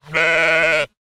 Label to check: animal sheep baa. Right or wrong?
Right